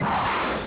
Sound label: Missile; Starbound II